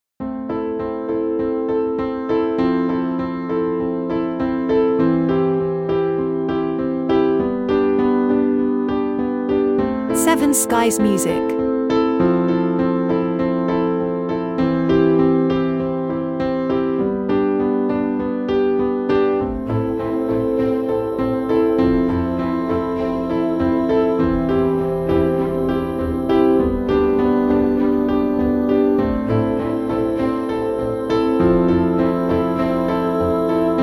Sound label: Glassy Sea